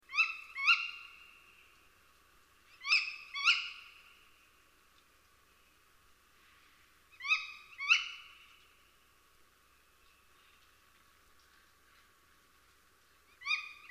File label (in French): chouette hulotte, chant